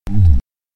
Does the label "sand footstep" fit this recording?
No